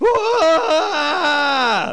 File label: woohoo